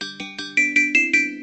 xiaomi redmi k50 pro sms ringtone

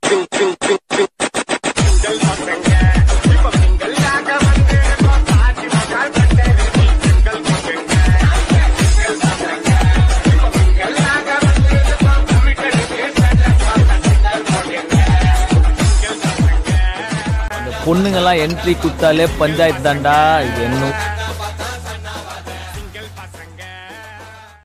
sweet remix ringtone, bollywood ringtones

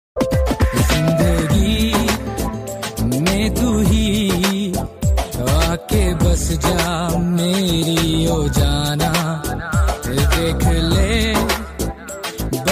love sad hindi ringtone; bollywood ringtones

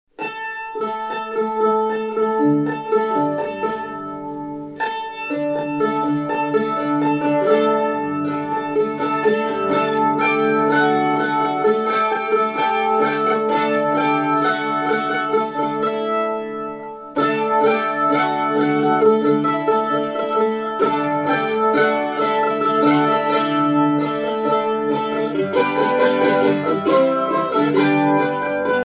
mandolin ringtone

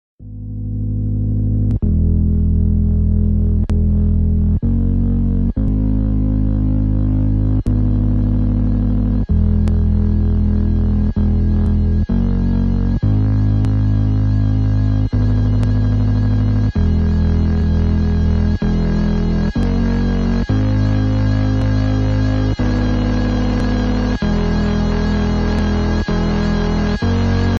some chords

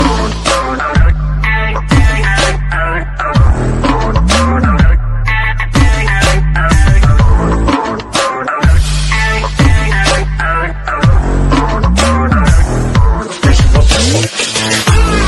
world famous cartoon ringtone

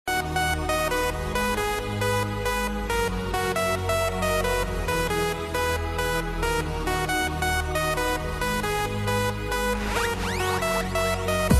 dance ringtone

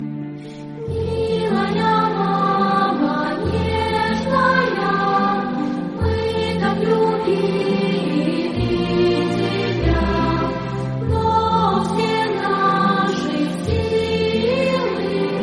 classical love music contact tone ringtone

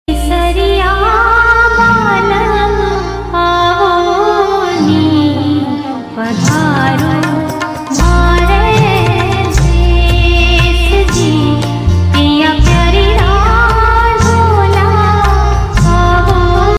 apple ring ringtone

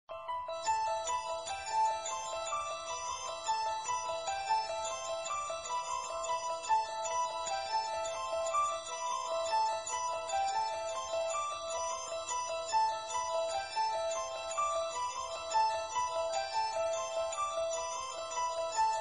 trending cool musical contact tone ringtone

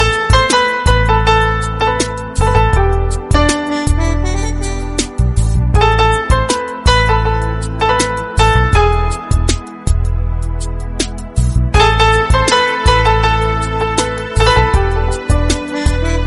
heart touching flute bollywood ringtone; tamil

